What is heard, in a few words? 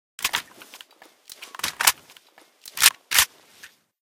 vihr reload, weapons